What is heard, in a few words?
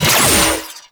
Trip Laser Impact; Trapper